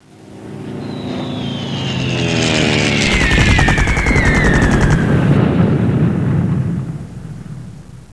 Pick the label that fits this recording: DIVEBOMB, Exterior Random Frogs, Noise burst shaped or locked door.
DIVEBOMB